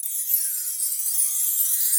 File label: Chimes A FX, Latin Percussion; Drum Hits; Bell; Ableton; Factory Packs